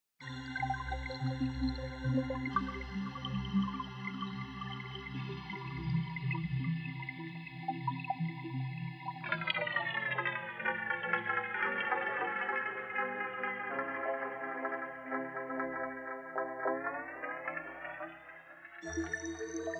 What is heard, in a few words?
Garden Pond; soniccouture flowstate; Kontakt